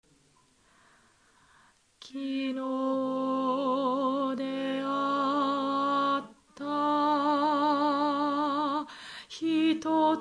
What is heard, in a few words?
alto part, choir